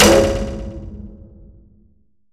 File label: taiko hit whistle; Crimson Stella